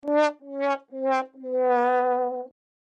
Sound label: sad horn